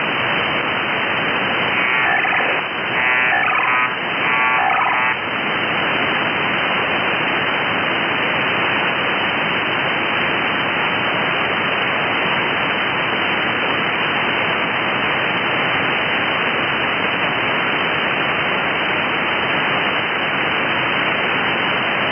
mil-188-141a ale 2g robust; fsk

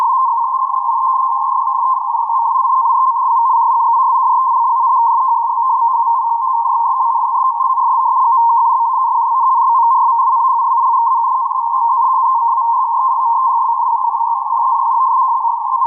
f1b sh 115 hz 75 bd

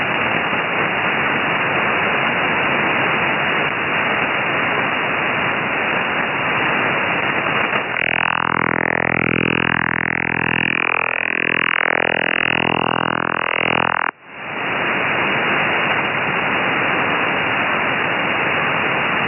foghorn bw 10k0e 41.6 sps

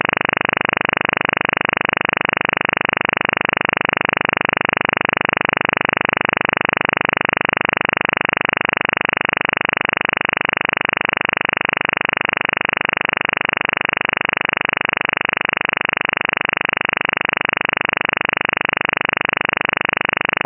othr fmop 20k0e 25 sps

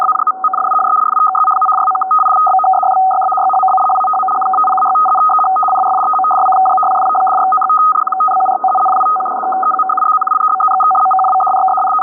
f1b 500 hz 75 bd

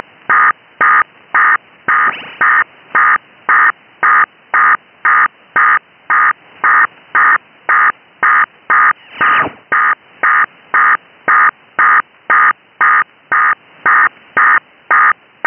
dprk-fsk 600 arq

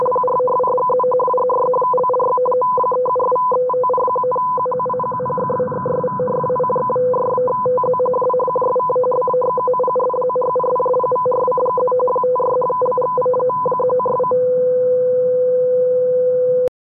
f1b bw 250 hz 50 bd

